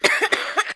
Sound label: Cough